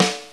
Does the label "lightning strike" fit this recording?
No